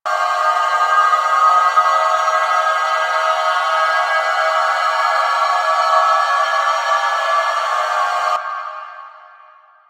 Light Loop; Warframe; Eximus; JadeLight

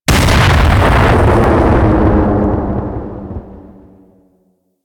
Orbital Strike Drone Explosion; Warframe; Vay Hek

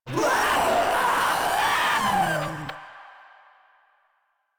Vocal Attack; Warframe; Proto Infested Runner

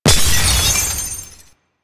Glass Ring Partially Destroyed; Warframe

